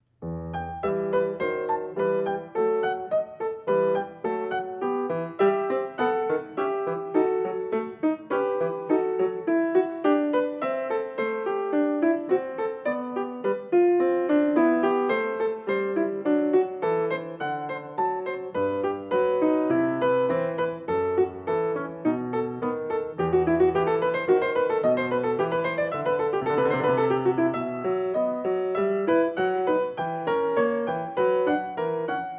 Prelude; BWV-900; Bach; Prelude & Fughetta in e

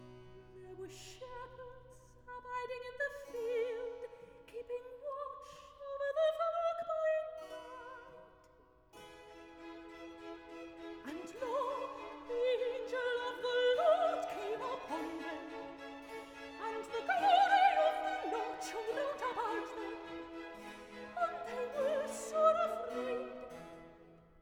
Recitative; There were Shepherds abiding in the Field; HANDEL; MESSIAH

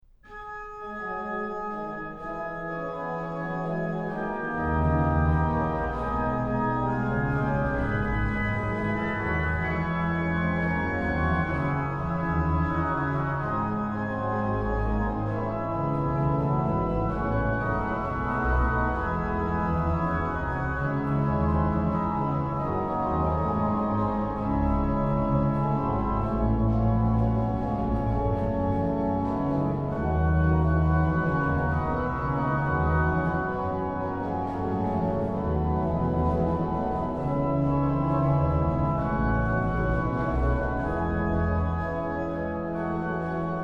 organ; BWV0627-1; Christ ist erstanden Vers 1; BACH; BACH ORGAN WORKS; CHORALE PRELUDES

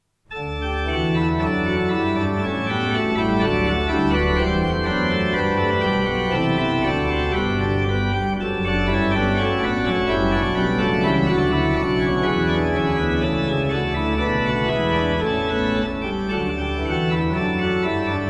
organ, choral preludes; Es ist das Heil uns kommen her; Bach; Bach Organ Works

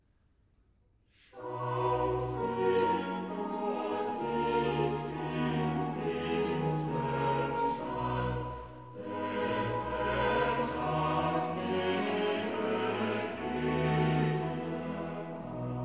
Chorale; Schaut hin dort liegt im finstern Stall; BACH; Christmas Oratorio

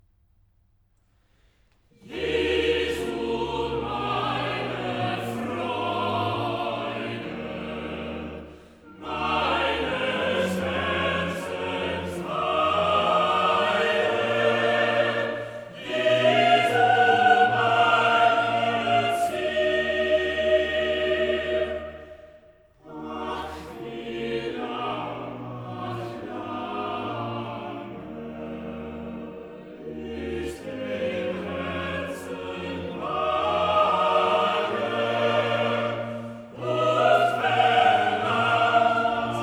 Motet; Jesu meine Freude; Bach; BWV 227

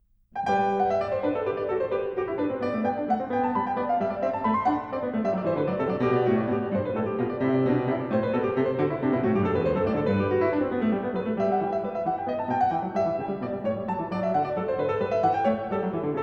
Courante, KLAVIER; French Suite No 5 In G Major; BACH

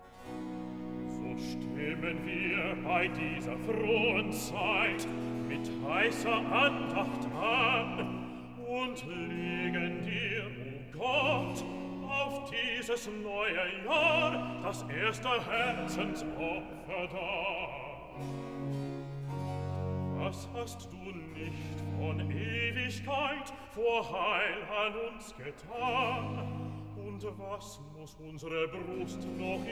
Recitative (Bass); cantata; BWV 016; Herr Gott, dich loben wir; So stimmen wir bei dieser frohen Zeit

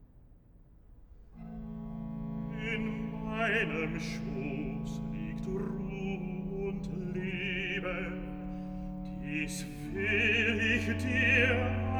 Recitativo Bass Sopran; cantata; BWV 057; Selig ist der Mann; Im meinder Schloß liegt Ruh und Leben

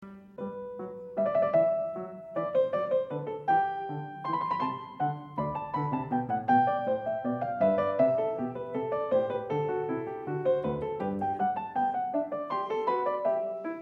Bach Goldberg Variations a 2 Clav, PIANISTS

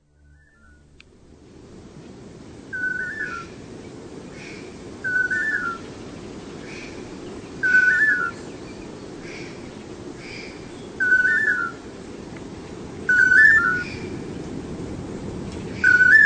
Unidentified pair of birds; Chris Watson

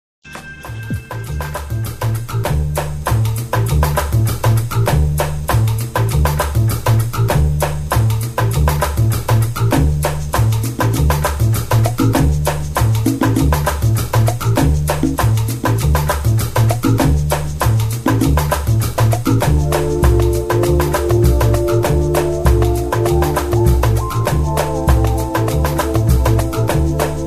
Samba

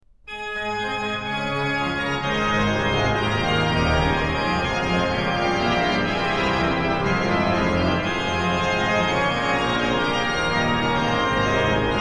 Herr Christ, der ein'ge Gottessohn, organ; BWV0601; BACH; BACH ORGAN WORKS; CHORALE PRELUDES

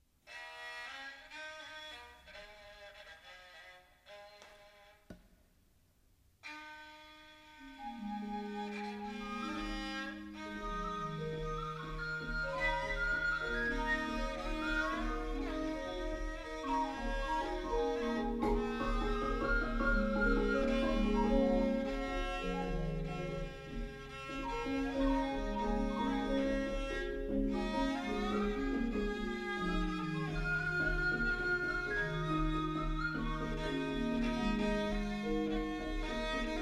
Javanese Gamelan; Pathetan Sanga-Wantah; Indonesian

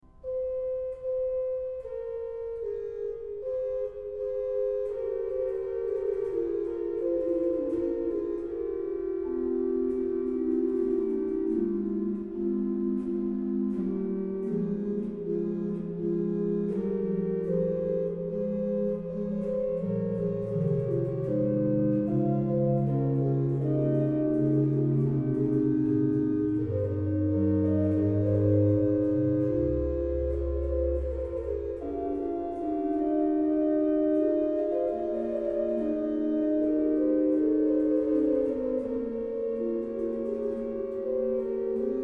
Fantasia in G, Adagio, ORGAN; BWV0571; BACH; BACH ORGAN WORKS

